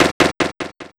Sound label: Snare, Kit; Sonic Foundry (Sony Creative Software); Esoterik Beatz; Annoya